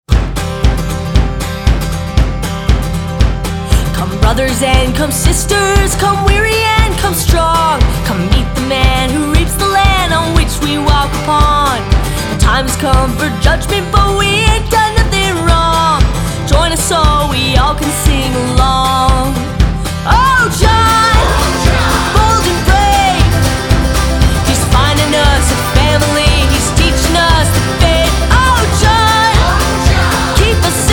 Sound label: country music